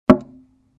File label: Medium sized wood panel knock